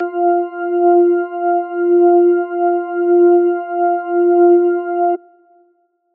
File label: Synth Shot F; Trap and Dubstep; Synth One Shots; Ghosthack; Free Trap and Dubstep Samples 2018